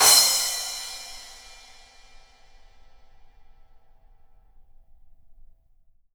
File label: Drums Percussion, Mixed Mic